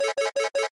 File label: Jetpack Warning Beep; Lethal Company